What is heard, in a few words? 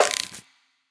empire game settlement ui repair construction